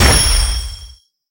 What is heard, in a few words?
login stamp